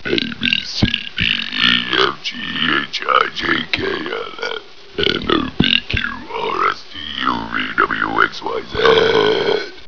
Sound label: Burps